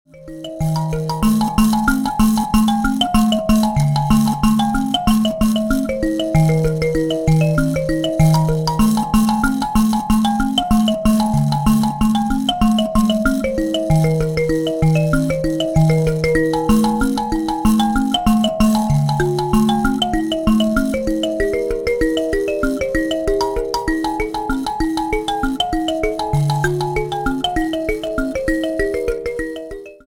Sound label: mbira